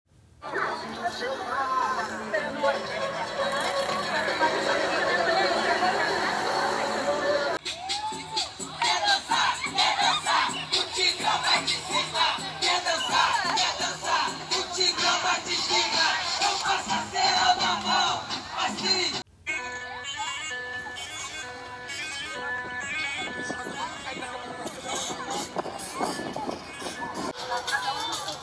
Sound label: Brazil, sounds around the world